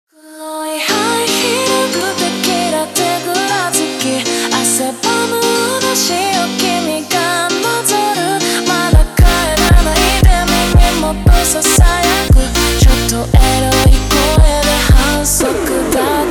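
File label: slowed, phonk music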